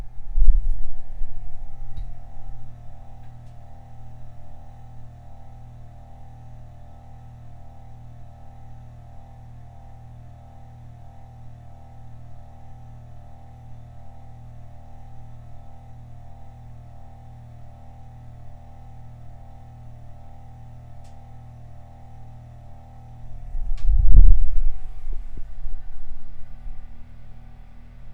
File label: Mechanical Drone